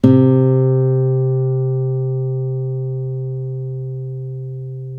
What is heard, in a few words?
ACOUSTIC; guitar; East West; Steve Stevens Guitar Samples Collection; ACOUSTIC FNG